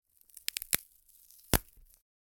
Polystyrene, Snap, Bone, Break, Gore, Quick, Fast, Sharp; Submerged Tapes; Polystyrene Destruction